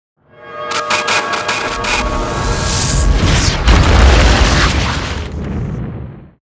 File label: Meteor